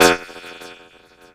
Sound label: Strange Stab; Zero-G Jungle Warfare 1, 2 & 3; 90 s Sample CDs